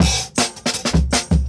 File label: Jungle Breaks; Original Jungle Breaks